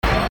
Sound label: Burst